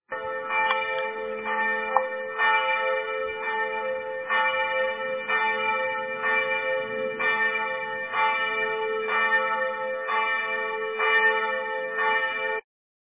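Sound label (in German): Glocke Dreifaltigkeit Münster, Glocken Dreifaltigkeitskirche Stuttgart-Münster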